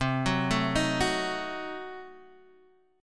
C Diminished 7th down Guitar Half Step Down

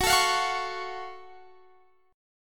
F sharp Minor Major 7th, Guitar Standard; x,21,19,22,19,21; strummed; capo=3

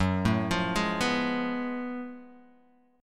F sharp Minor Major 11th, Guitar, Half Step Down

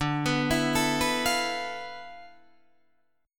D Minor 6th Add 9th D, Guitar, Open D

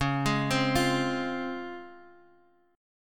C sharp Major 7th, Guitar, Half Step Down

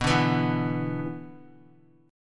B-Minor Double Flat 5th, Guitar

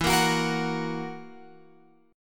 E Suspended 2nd Flat 5th E down Guitar Open F; strummed; JGuitar

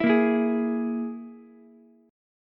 Gsharp Suspended 2nd Sharp 5th, Ukulele; strummed

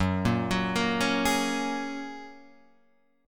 F sharp Major Add 9th, guitar; F#add9 Chord {2 1 4 3 2 4} arpeggiated

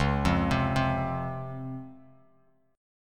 Db Major 7th, Bass Standard; arpeggiated; JGuitar

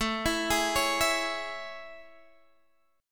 A Major 7th A, Guitar, Standard; AM7 arpeggiated; x,12,14,13,14,12; JGuitar

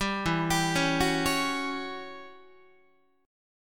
E Minor 6th Add 9th Guitar; Em6add9 arpeggiated; 10,12,10,11,10,12; JGuitar

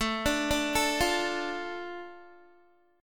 A Suspended 4th Sharp 5th down Guitar; Asus4#5; arpeggiated

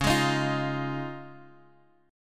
Db Major Add 9th, Guitar, DADGAD; Dbadd9; strummed; 11,11,13,10,x,x

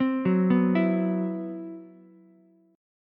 C Major 7th Flat 5th, Ukulele, chord; CM7b5 arpeggiated; JGuitar

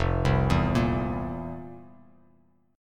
F Major 7th Suspended 4th F, Bass Standard; FM7sus4 arpeggiated; JGuitar

